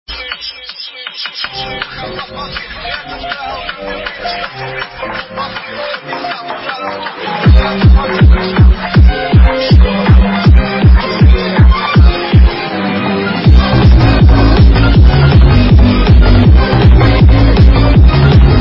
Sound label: hardbass remix